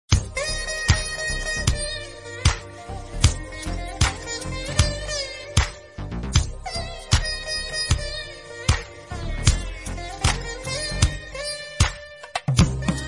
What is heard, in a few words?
Tamil Bgm Ringtone; ringtones